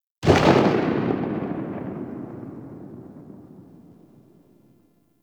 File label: Sniper Tail; Warframe; Tenno